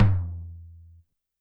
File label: Tom Lo-Fi, YAMAHA RM1X